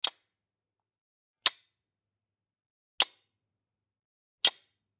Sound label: Generic Button Metal Tiny; boom library; The Interface